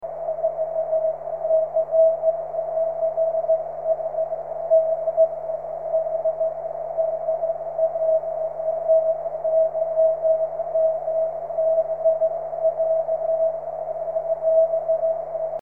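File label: 13cm continuous wave; dl1ymk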